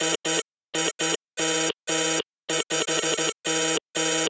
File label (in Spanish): LLAMAR TELEFONILLO; Yesterday Origins